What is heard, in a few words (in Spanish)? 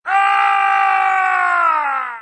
grito aaaaaarrrrgggg